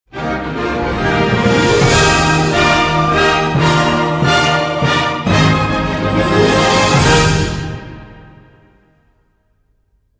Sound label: Stage Clear